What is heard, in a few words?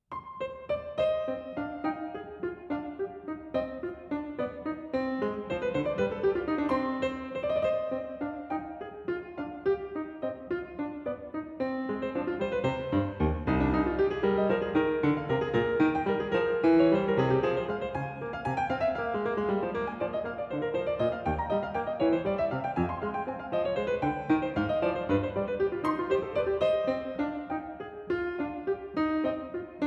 Rondeaux from Partita c minor; J.S. Bach